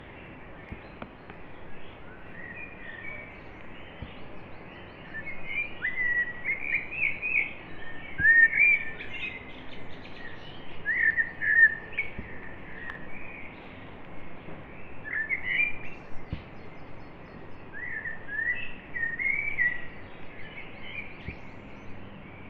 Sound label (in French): Foret oiseau coucou a la fin; Oiseaux